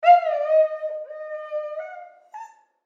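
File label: sounds with dog howling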